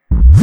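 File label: hard bass drums; Bazzdrumz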